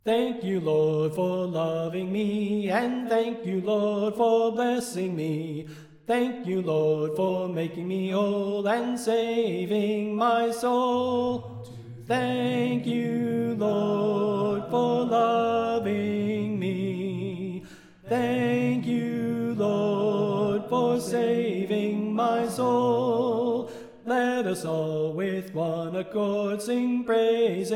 Soprano Left

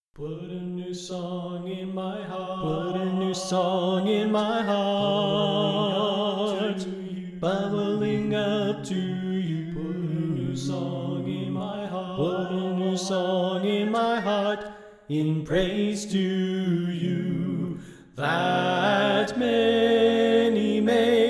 Soprano Left

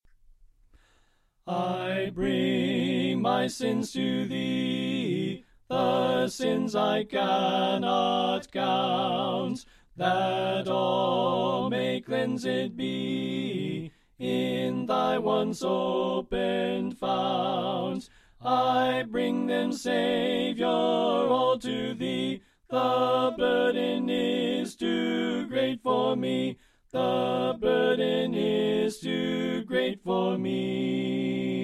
Tenor Left

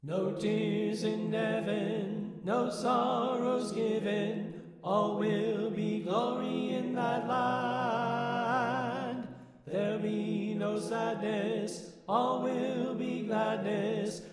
Tenor Left

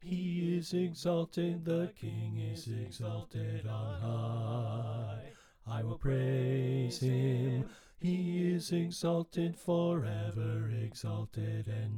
Bass Left

